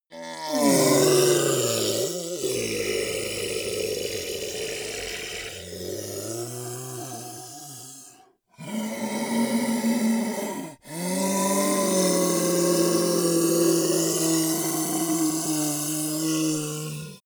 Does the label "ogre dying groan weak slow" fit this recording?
Yes